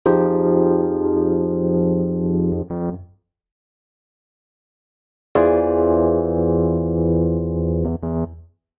Ostinato Hip Hop F Minor - E7alt 90 bpm, Electric Keyboards; MIDI Clips; Ableton; Factory Packs